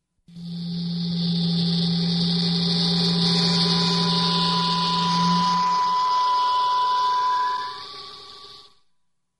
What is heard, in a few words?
transporter dematerialization